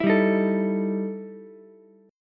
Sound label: E Minor Major 11th E Ukulele C6; EmM11 strummed; 9,7,11,12